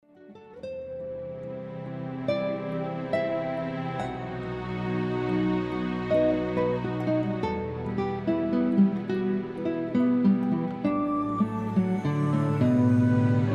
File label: wedding meditation, guitar